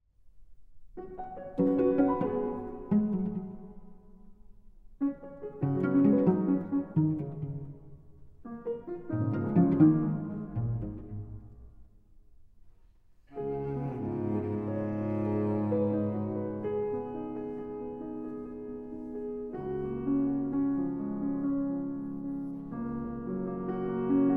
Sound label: Cello; Janacek Nr2